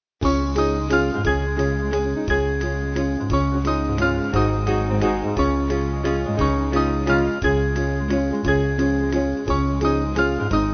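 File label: Band, Music Backing Files for Churches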